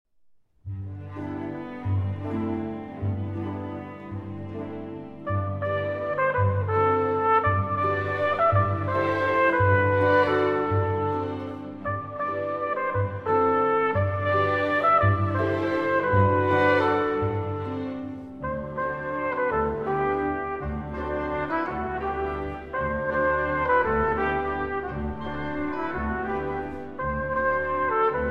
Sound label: 7 Canciones Populares Españolas No. 6, Canción, For Trumpet and Orchestra; Falla; Arr. Lehn